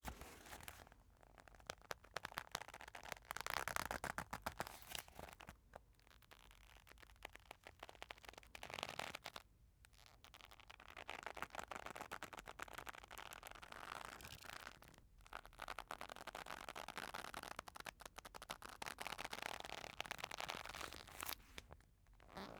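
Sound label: Leather Scrunches Close-up Friction Rubs; Foley; Foley Essentials; Foley - Misc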